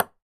Ball Collision Ping Pong Hard

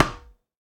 Tennis Racket Ball Hit Hard

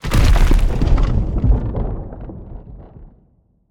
Trap Crumble; Scraprun; Deathrun

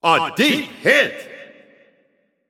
Long Hit; H0wRd C0Sine Driftball

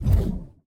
Hand Paddle Release Hard

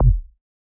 Ball Collision Forcefield Extra Light

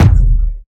Player Head Collide

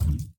Hand Paddle Attack Light

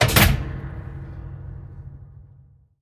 Chunky Switch; Deathrun

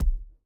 Ball Player Hand Hit Tackleball Extra Light

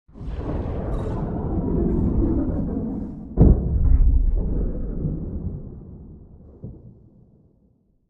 Skybox Portal Closing

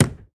Joystick Edge Hit; Deathrun

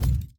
Hand Paddle Attack Med

